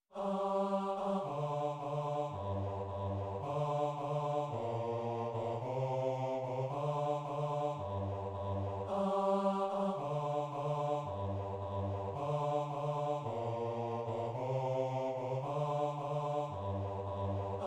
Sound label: bass; now I see